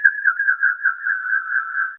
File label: small chibi ghost surpris